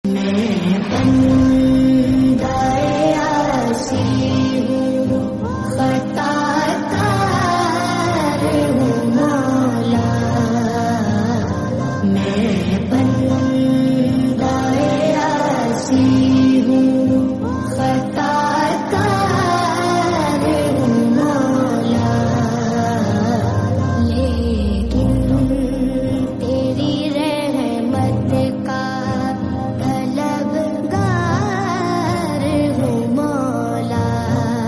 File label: slowed reverb naat